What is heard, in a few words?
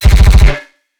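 Distorted Riddim Machine Gun, bass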